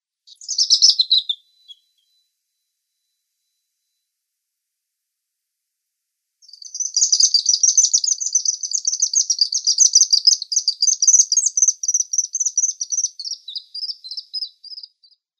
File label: White-throated Needletail